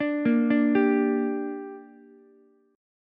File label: D Suspended 4th Sharp 5th, Ukulele; arpeggiated